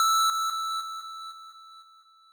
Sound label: lead